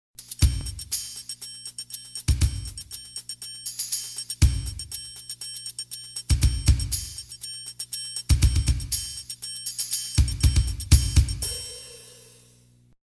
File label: game show track percussion music